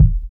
boomy bass drum sound d sharp key, kick drum single shot tuned to the D# note; kick drum samples